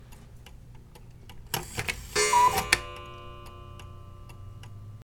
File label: Coocoo Clock Half Hour